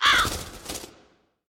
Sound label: crow caw; sfx